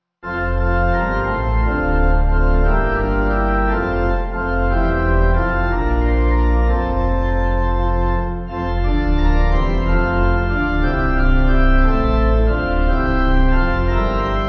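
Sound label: Pipe, Pipe Organ backing; Corner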